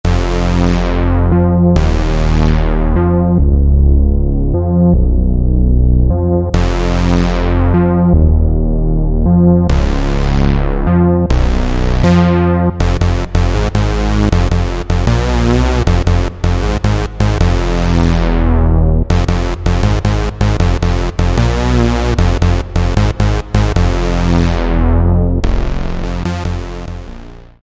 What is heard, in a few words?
BIG BASS; Akai SG01v